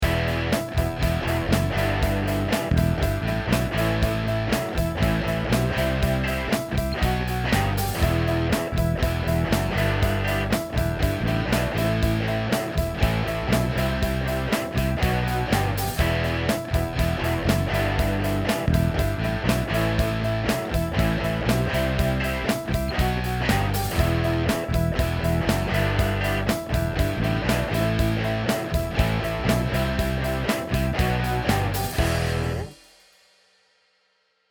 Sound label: Medium Rock Groove; production music